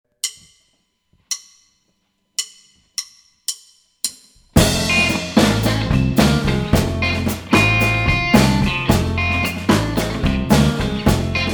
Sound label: Rhumba Blues In F